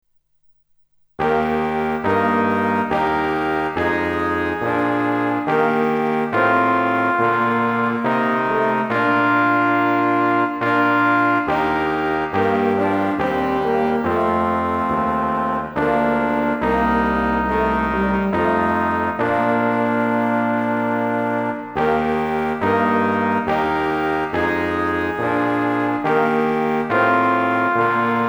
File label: Chorale; Bach